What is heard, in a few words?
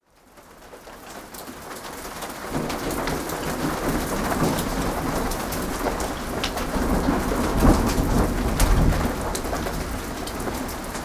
ambience